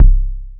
Bass Drum, Cwejman S1 MK2, Drum Machines

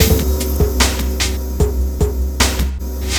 Beat, Futurist Drum & Bass; Drums & Percussion

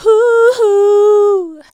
VOX, Singin

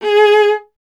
viola, solo instruments

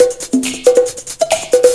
Conga loop; Urban Shakedown

